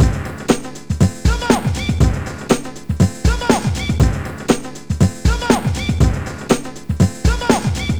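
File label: Pop Combo Beat, C#min, 120 bpm; Digidesign; Pro Tools; Pro Tools Essential Loops; Pop Rock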